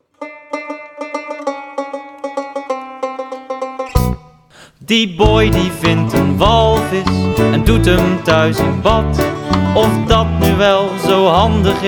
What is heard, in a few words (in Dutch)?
refrein